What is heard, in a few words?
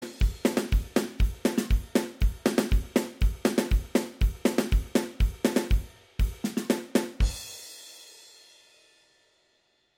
March